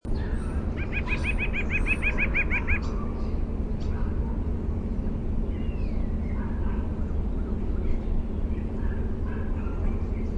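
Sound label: song, white-breasted nuthatch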